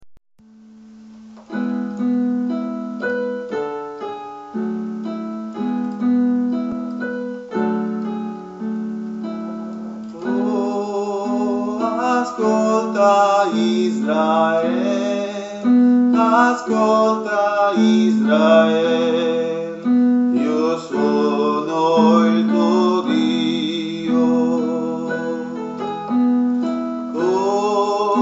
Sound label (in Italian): Ascolta Israel (ten); canti; registrazioni